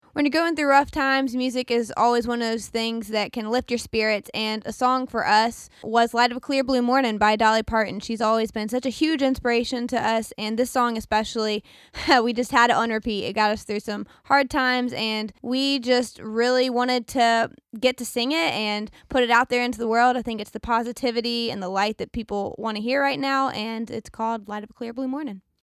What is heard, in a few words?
background